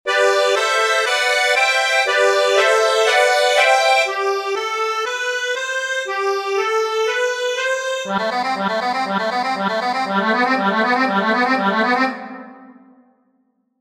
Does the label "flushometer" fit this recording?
No